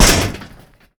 land metal grate, footsteps; player

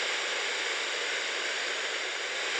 missile gas; Counter Strike Model Pack; CS:GO; survival

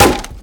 wood solid impact bullet; physics

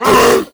grunt hard, zombie vox; creatures

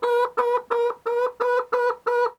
chicken idle, creatures; Counter Strike Model Pack; CS:GO

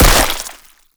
break flesh; physics; breaking

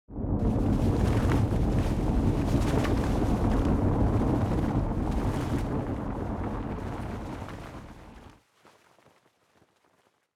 dropzone freefall; Counter Strike Model Pack; CS:GO; survival

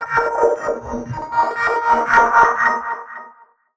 hacking holo appear

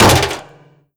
vent step, headcrab reviver, creatures

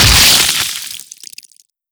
host abandon exit, creatures, headcrab reviver

